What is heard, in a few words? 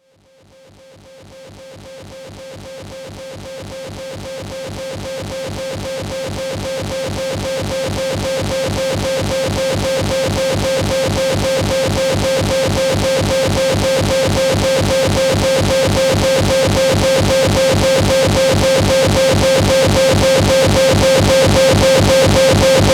drone loop, noise